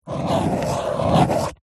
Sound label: office pen ballpoint paper hollow table draw circle; zapsplat